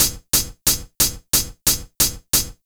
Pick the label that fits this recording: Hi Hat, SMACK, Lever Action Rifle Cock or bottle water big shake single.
Hi Hat